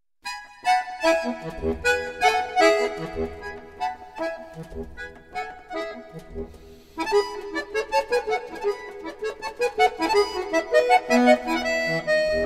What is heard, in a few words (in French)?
sonate mi majeur